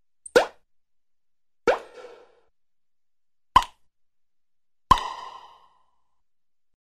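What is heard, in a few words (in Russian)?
звуки пробки от шампанского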